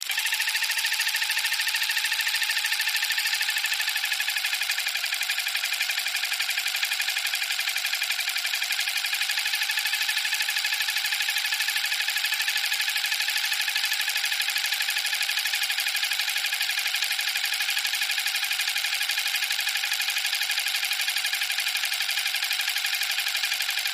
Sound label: Motor, Small; Electric Toothbrush, Slow Constant With Variance, Loop